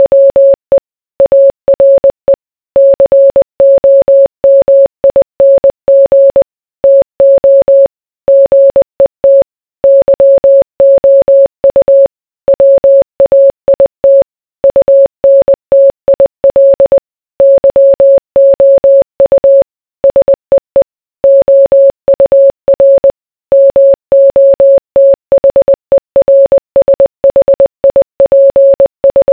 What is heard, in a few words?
message sent